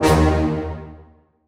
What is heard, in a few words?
brass